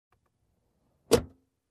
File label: Closing compartment